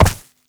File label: Step Vegetation; Movement; Uriel; Warframe